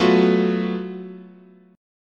F sharp Minor Major 7th Double Flat 5th, guitar chord